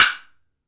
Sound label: woodblock; AKAI S-Series CD-ROM Sound Library VOL-5